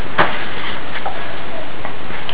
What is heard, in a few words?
baby noise